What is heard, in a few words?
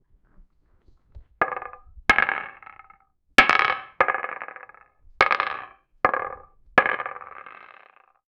the sound of a boulder smashing into a wall